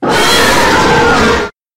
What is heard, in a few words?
screamer